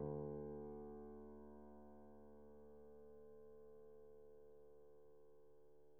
piano pp, steinway

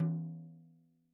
tom hi, gretsch kit

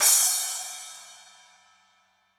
splash, gretsch kit

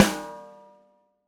snare, gretsch kit

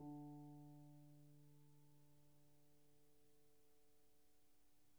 piano pp, steinway

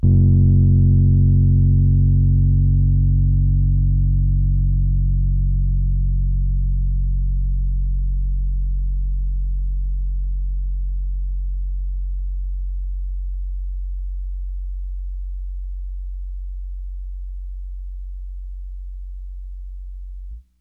rhodes mark ii f 0; fender rhodes mark II

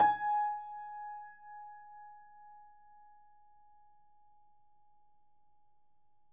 piano ff, steinway

